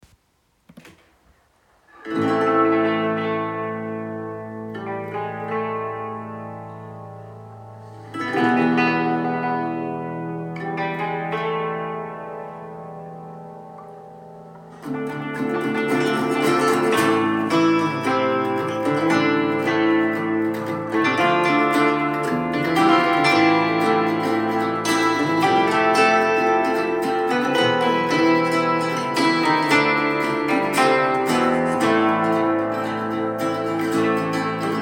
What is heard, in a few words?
Hackbrett